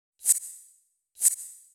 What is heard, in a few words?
VOICE GRL HOUSE 125 A, House Voice